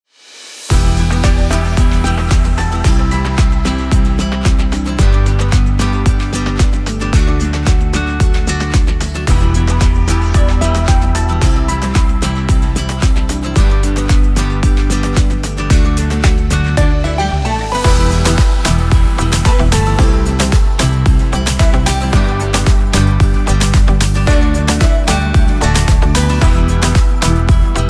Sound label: Promo Theme; Das Inselradio Mallorca